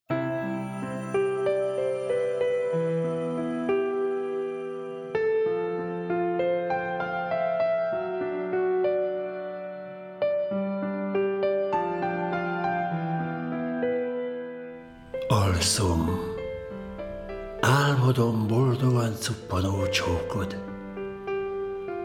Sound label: piano music